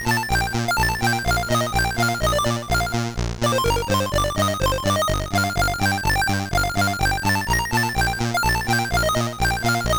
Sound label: Bubble Bobble VICE 8580 ReSID, c64; Commodore 64; VICE emulator